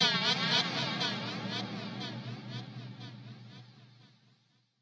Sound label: scifi efx